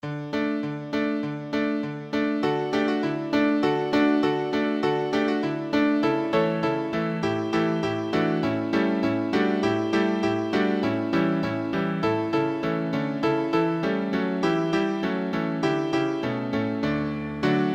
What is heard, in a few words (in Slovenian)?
vokal in klavir; potep v pravljico